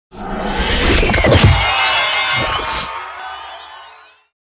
Music Frontend

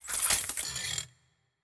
Pistol Hero Head Moove

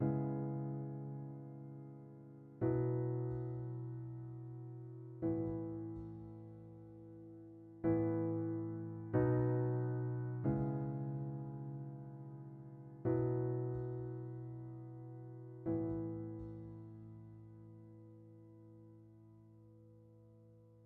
Piano; starbites

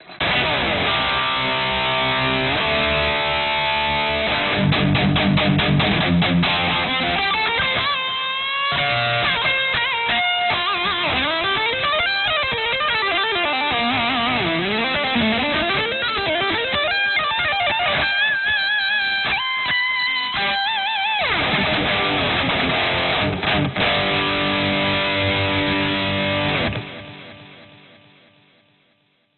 guitar solo

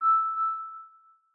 Golf

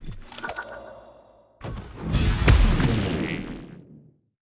Analysis; Makobo

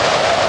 Sfx Winter games

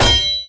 Enemy Defended Sword

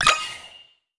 ui academy button yes

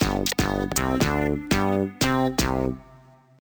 Transition

